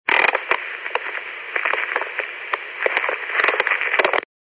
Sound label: comm walkie talkie noise short